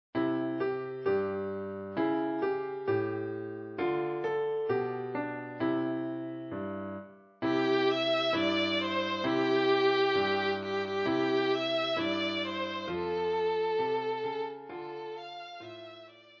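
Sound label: violin; Jingle Bells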